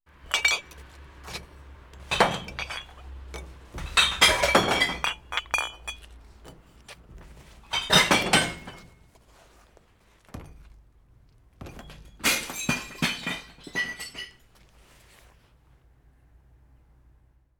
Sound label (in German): Glascontainer